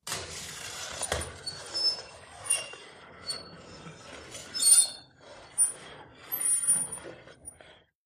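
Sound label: High Pitch Metal Screech, crashes & creaks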